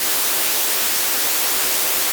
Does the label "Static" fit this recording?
Yes